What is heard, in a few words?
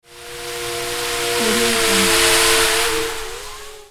little drone flyby